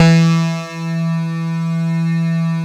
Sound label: PERC BA; Synclavier Sampler Library; KEYS AND GUITARS